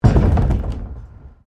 PLASTIC BARRICADE MOVE; Material components for constructing more complex sounds

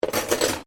Cutlery general drop drawer, Household cutlery; Kitchen; SoundEffects Foundation2

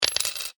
money coin drop, Coin Drops Onto Table; Coin ( Single ) Drops Onto Wooden Table; foley; Objects; McKinney Sound

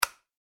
household switch light flick; Light Switch ( Wall Mounted ) Single Click; McKinney Sound

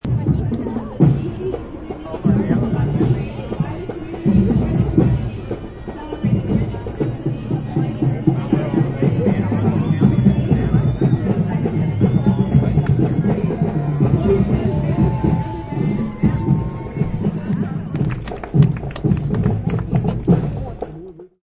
Parade, Parade ambience; Parades

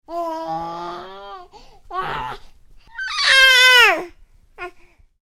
Baby: making vocal noises, happy sounding; Babies; Voice; Stuart Duffield

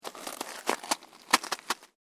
SNOW SCRAPE

